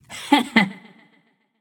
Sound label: hehheh; smite; pele